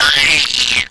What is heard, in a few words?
death; imp